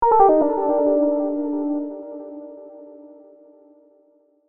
Mistery synth descending tone

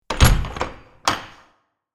Heavy cottage door close

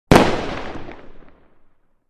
Fireworks single explosion shot

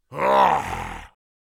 Orc creature pain

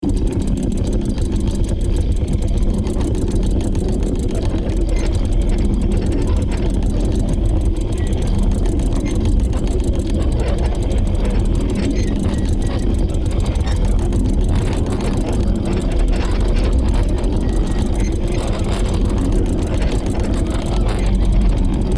Machinery gears rattle loop; gfxsounds

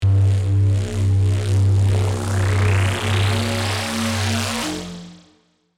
Charging energy power, anime

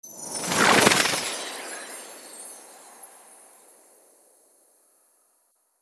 Fairy wings fluttering

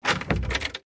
Home wooden door opening

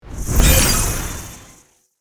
Puzzle game power up achievement

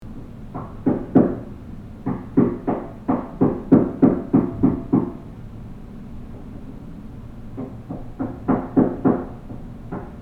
House renovation ambience hammering and drilling

